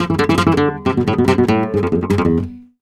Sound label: Flamenco Guitar Picado, e; Best Service ProSamples vol.46 - Flamenco